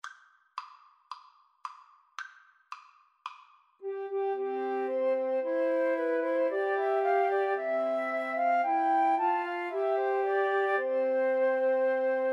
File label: twelve days, Flute Trio